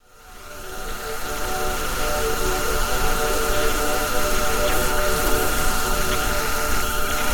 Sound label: Glitch; MiSide